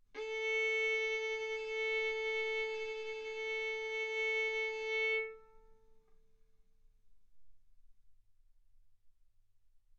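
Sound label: Violin arco mf A string A4 B4 stereo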